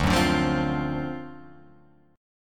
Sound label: D 7th Sharp 9th D down Guitar Open F; strummed; JGuitar